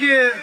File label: Vocals; TRUTH-HZ; Crowdsourced Sample Pack